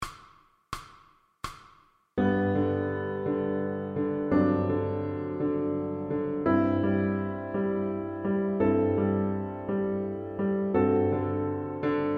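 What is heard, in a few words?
Andantino, Piano Accompaniments for Violin; MTB Grade 2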